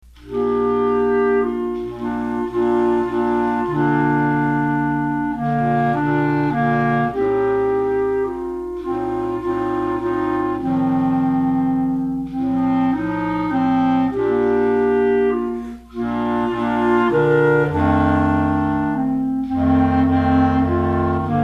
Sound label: Sonata Piano; Schubert